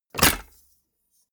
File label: mechanics small movement